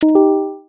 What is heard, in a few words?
alert gentle